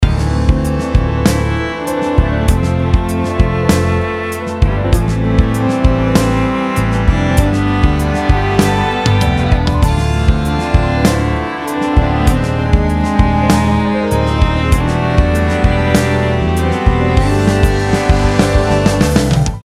tips for chord progressions

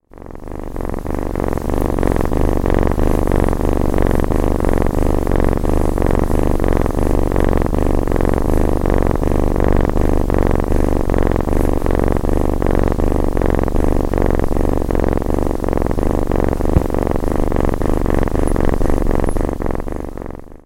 kitten purr

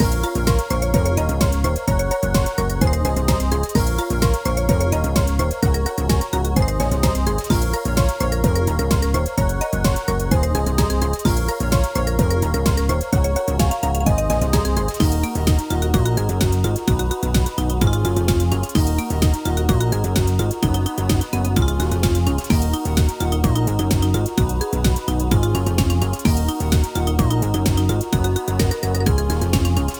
mallet house

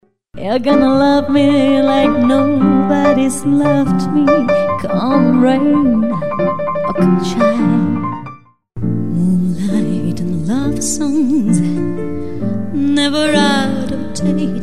mix songs jazz

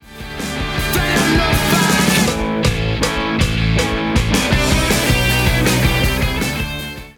Guitar riff

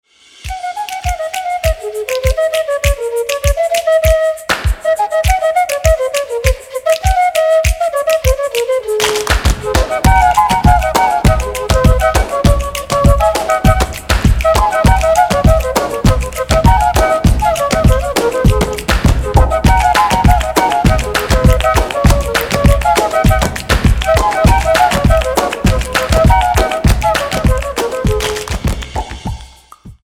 SAMBA - Baiao (50BPM)

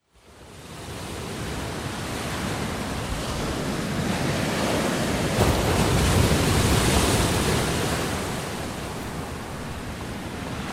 Ocean

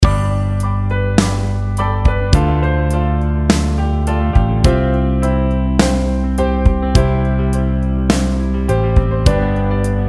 common chord progression

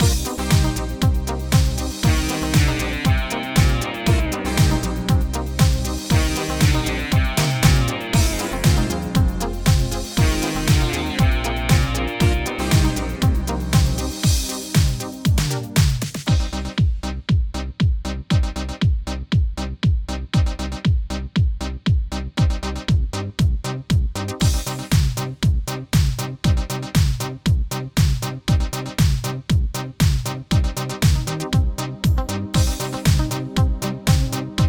Dance

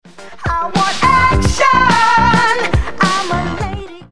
Vocals ('I want action!')

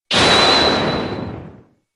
shouting

